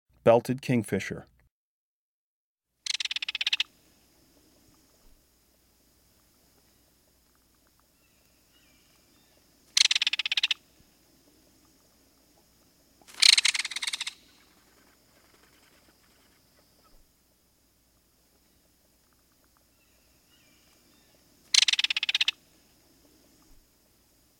Belted Kingfisher; Breeding Birds of the Chicago Region

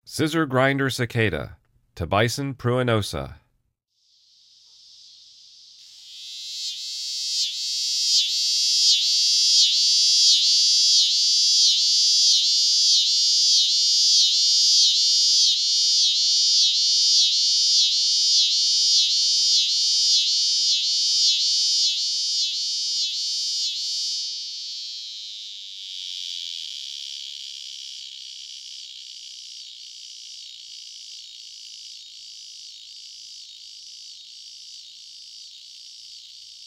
Scissor-grinder Cicada; The Songs of Insects

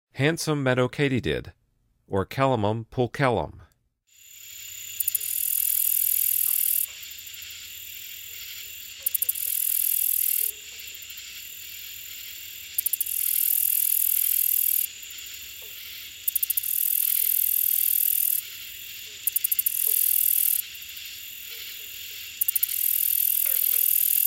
Handsome Meadow Katydid, Insects; The Songs of Insects

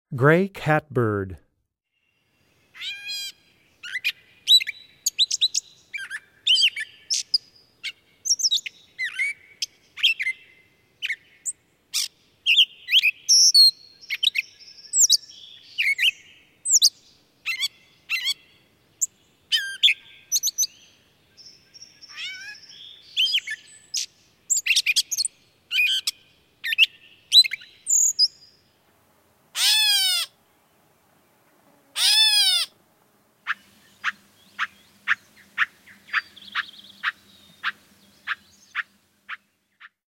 Gray Catbird, Wildlife Sounds

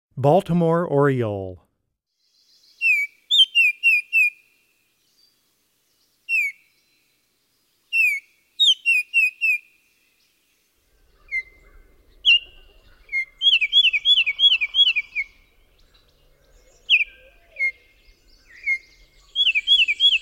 Baltimore Oriole, Wildlife Sounds

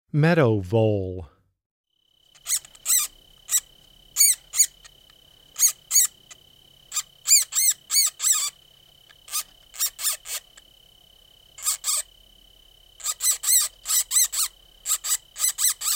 Meadow Vole, Wildlife Sounds

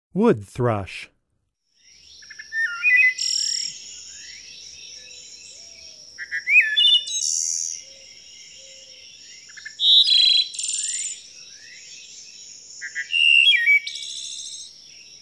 Wood Thrush, Wildlife Sounds

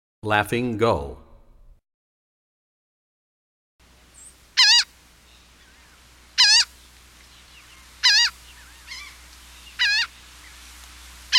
Laughing Gull; Animals; Birds; 101BirdSongs

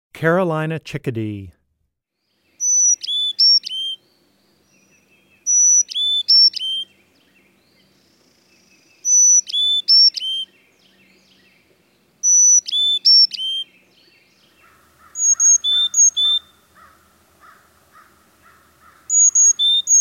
Carolina Chickadee, Wildlife Sounds

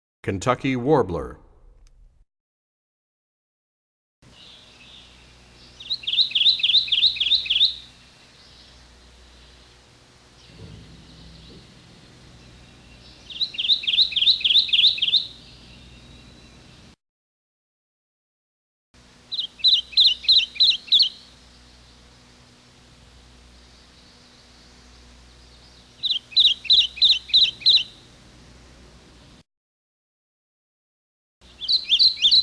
Kentucky Warbler, Bird Songs; Animals; 101BirdSongs

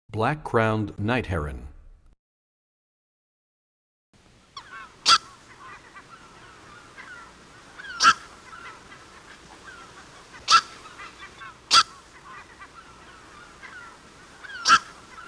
Black Crowned Night Heron, Bird Songs; Animals; 101BirdSongs

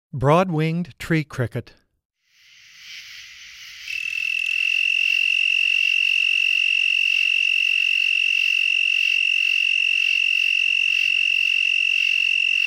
Broad-winged Tree Cricket

